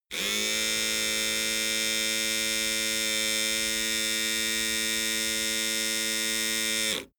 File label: servo steady long